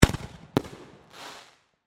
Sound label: Firework Mortar, Shot, Explosion, Crackles; Fireworks; SoundEffects-BlastWave